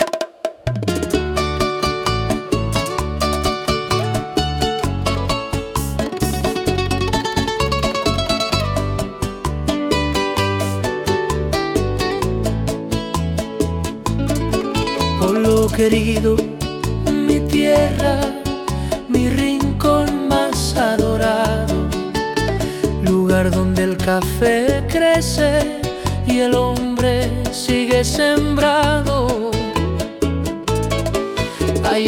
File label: Bachata